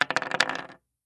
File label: dices, backgammon